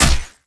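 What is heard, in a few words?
crowbar hit body; weapons; cbar; tf2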